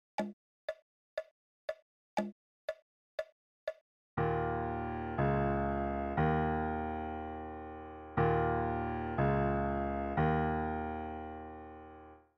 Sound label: Blue Ditty Duet